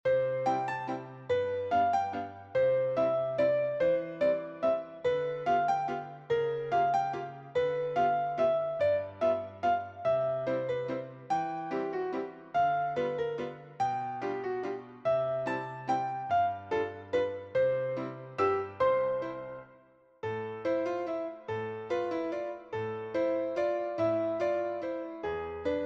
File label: Waltz in C duet